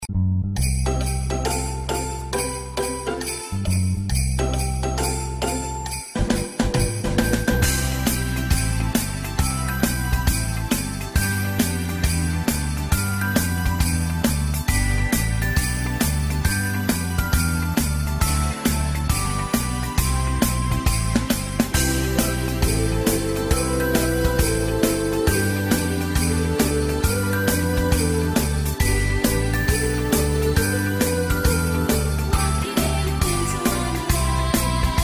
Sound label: karaoke